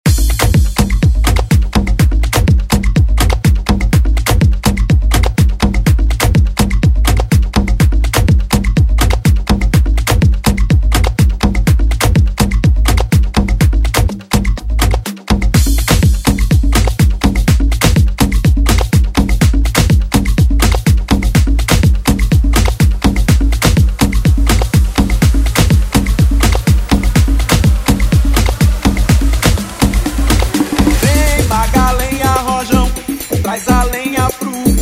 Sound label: Afro House; DJ Mhark